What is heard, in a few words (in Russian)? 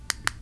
nazhatie knopki fonarika